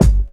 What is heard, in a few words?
nineties rap kickdrum sound g key